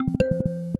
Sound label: Stereo Win, notifications; Motorola; Edge 70 Max China